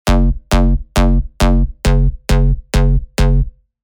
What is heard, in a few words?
Offbeat Pump, techno bass; Spire; revealed spire techno bass vol 1